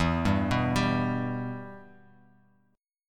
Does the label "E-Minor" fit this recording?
Yes